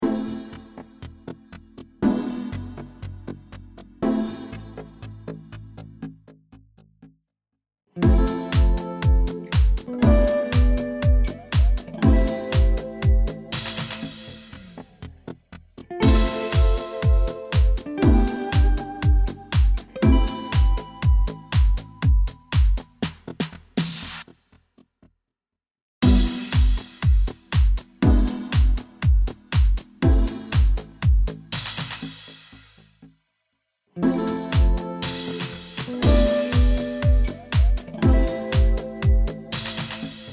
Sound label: Spectral Pizzicato